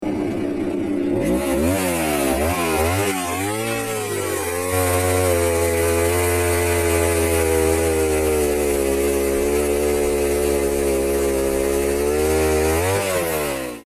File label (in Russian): benzpila